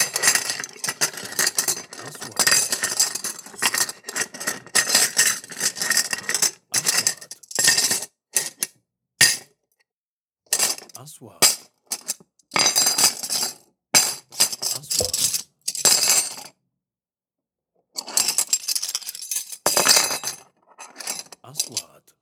درج ادوات مطبخ معدنية; sound effect; kitchen

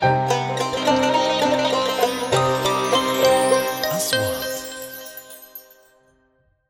نغمة شعار; sound track

